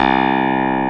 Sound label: CLAVINET C2; E-MU Formula 4000 Series Vol. 3; Analog Odyssey